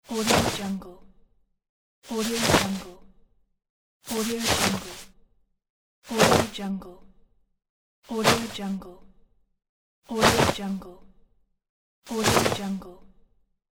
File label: cloth shirt movement; aetherfx